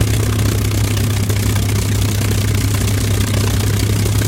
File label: mustang side, engines; War Thunder